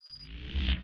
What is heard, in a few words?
tesla draw; Dystopia; weapons